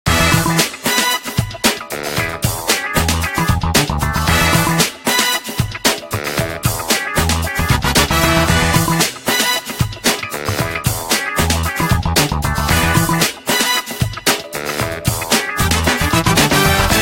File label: Funky Machine, ringtone, club dance; Samsung SCH-W770